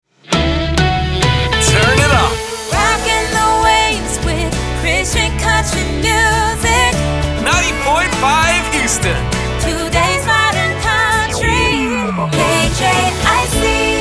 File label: Sung Jingle, 100bpm A Major, SLOGAN; KJIC 2020